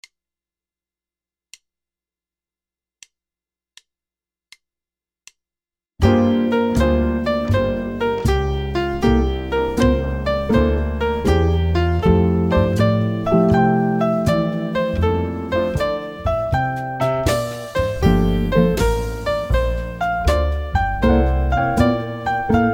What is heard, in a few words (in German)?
f-dur 2-5-1 swing 80